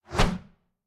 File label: swing blade 2 hand